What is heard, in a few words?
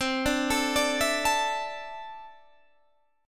A Suspended 4th C; Asus4/C; arpeggiated